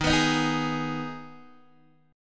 D sharp Suspended 4th Sharp 5th D sharp, Guitar Open D; chord shape